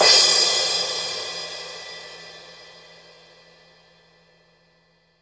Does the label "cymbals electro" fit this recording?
Yes